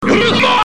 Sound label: hammer